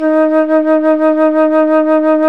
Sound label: FLT FLUTE, Flt vib; Roland L-CDX-03 Disk 1